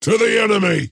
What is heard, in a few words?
move pain; dota; axe